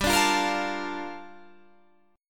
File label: Bb Major 7th, G; Bbmaj7/G strummed